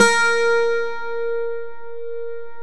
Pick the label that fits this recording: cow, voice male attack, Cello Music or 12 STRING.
12 STRING